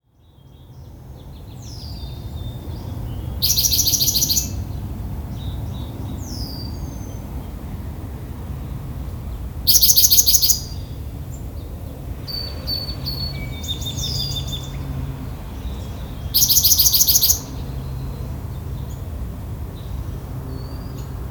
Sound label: Spotted Towhee Pipilo maculatus; BC